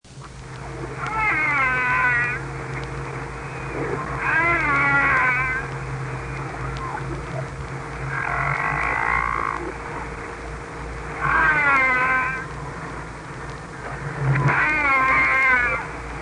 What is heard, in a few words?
gray whale